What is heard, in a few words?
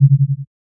smash; noteye; roguelike